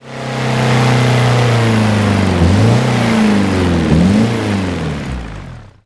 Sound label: throttle off, vehicles; gmod; tdmcars; willys